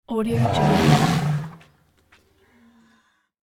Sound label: elephant snort